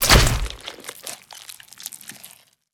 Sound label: Tongue Grab Hit; Evolve; Behemoth